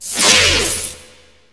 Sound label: G#3 ZAP; RED VOLTAGE